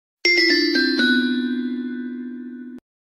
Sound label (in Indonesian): nada dering; Gojek Cancel